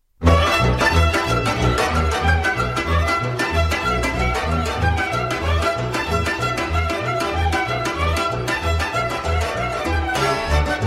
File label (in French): Roumanie; Vulpiuta